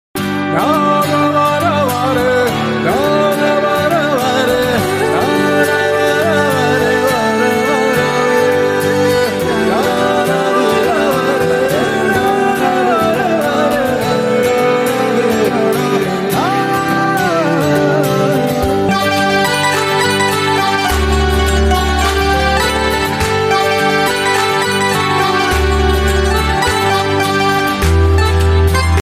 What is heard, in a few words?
ringtone; oru adaar love